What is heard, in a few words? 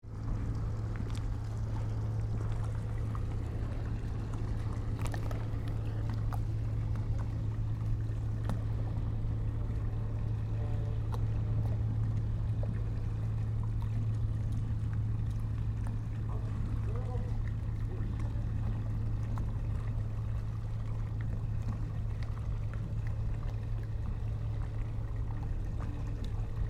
Noko Ferry